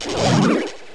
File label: Fell Stinger